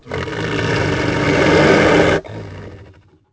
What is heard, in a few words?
were growl; The Elder Scrolls III Morrowind GOTY; XBOX